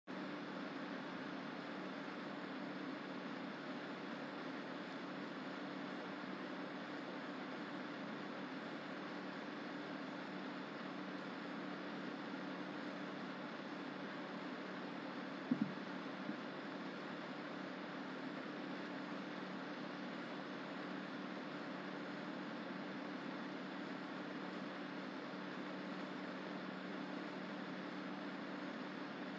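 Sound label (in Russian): Системный блок кулер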